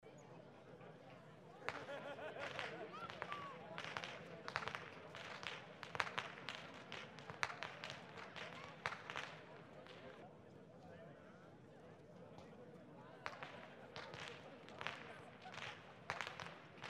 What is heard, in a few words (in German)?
schnalzen, jugend; waging